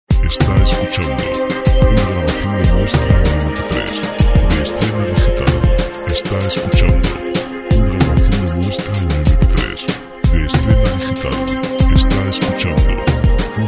documental electric 120; Música para audiovisuales; documentales

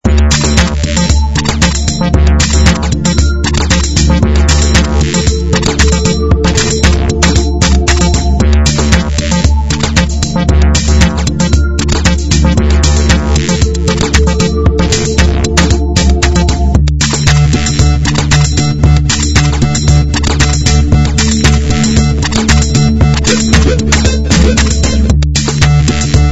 royalty free music

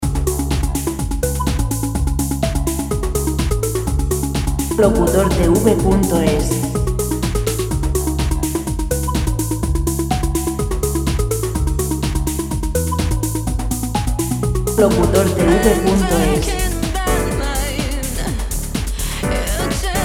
125 dance vocal; dance musica libre

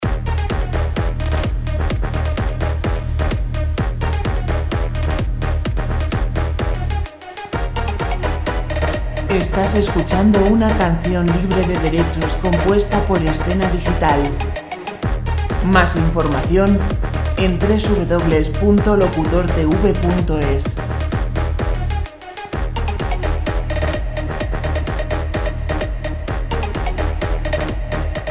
128 E dance

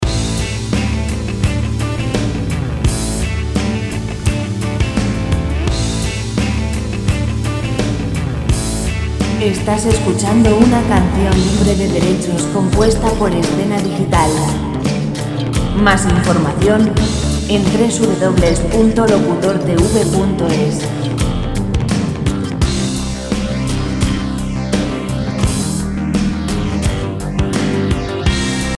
85 rock

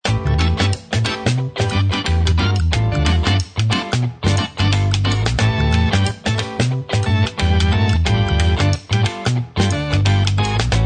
rock royalty free music

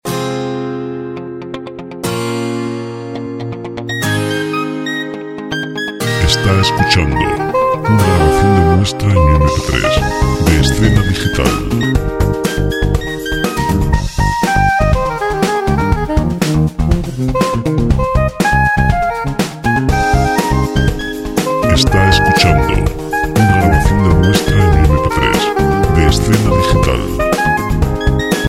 pop

